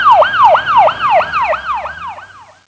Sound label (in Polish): syrena